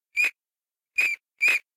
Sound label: Rat Squeak; SyrinScape